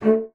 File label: bassoon A3; C BASSOON; Xsample VOL-6